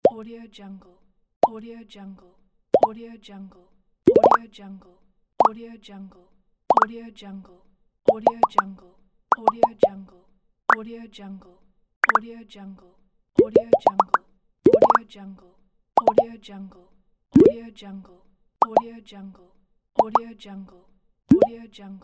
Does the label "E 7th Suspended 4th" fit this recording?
No